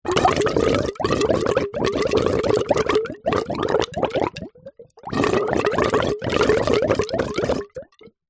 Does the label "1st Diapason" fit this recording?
No